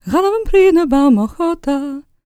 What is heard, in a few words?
GAELIC, World Voices, GAELIC FEM